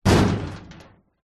impacts metal hollow cabinet old knock hard rattle